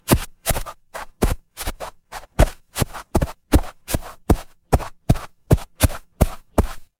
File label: footsteps snow